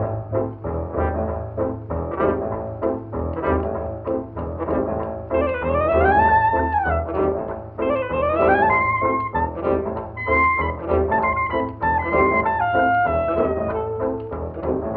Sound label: piano and sax old timey silent film, loops, phonky